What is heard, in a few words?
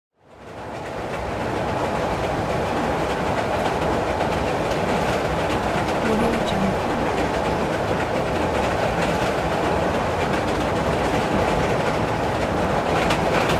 train ride